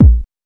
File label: solid kickdrum sample a sharp key, Royality free kick drum tuned to the A# note.